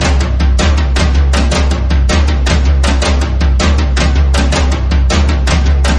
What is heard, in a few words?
drums; horde